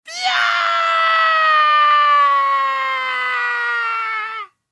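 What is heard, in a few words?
Male Long Fall Scream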